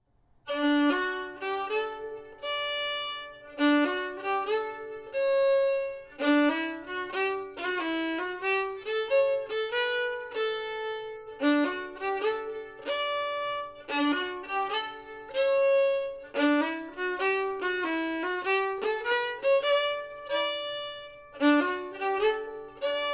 slow, fiddle; Eg e liten eg

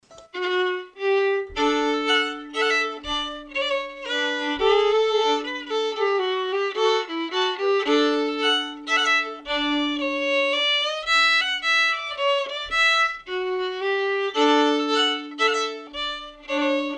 waltz slow; fiddle

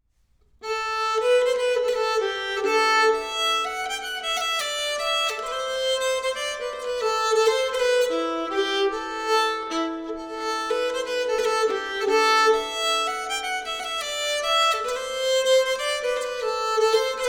Long Dance Swedish, fiddle

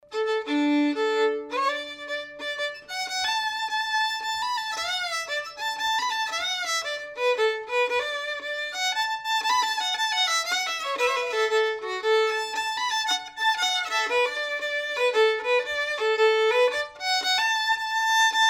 waltz slow, fiddle; kathleens

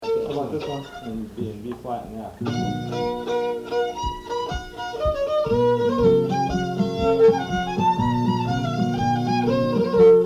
Jig in Bb and F; greatlakes

